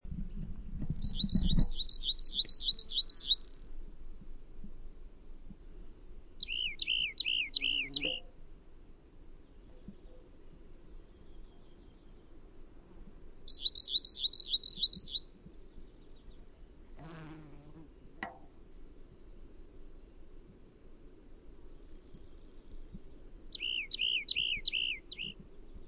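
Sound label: song, rock wren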